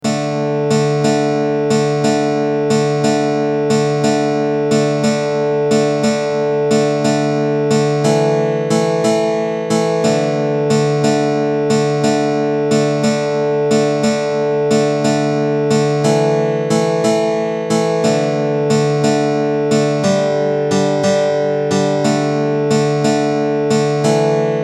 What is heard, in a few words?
On the Village Green Lute